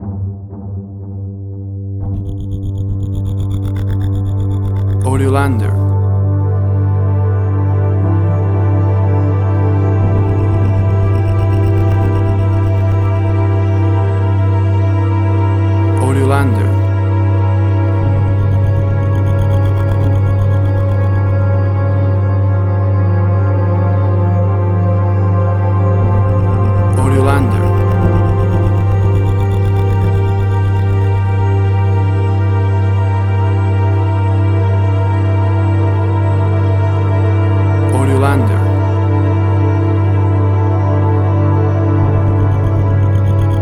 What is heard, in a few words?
A Horror Soundscape Ambient Pad